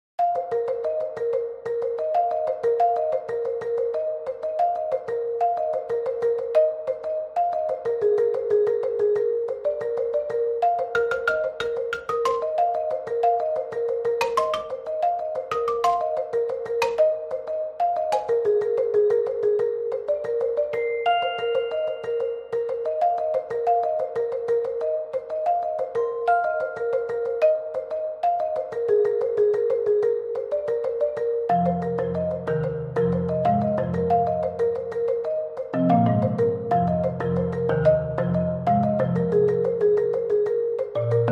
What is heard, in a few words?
Mallets